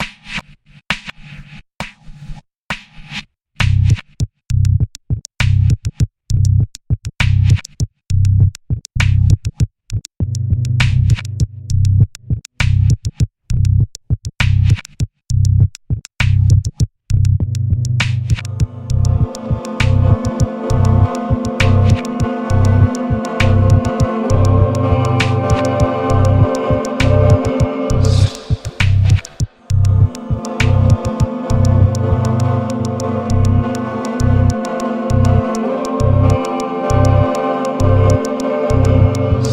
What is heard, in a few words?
medium 6-8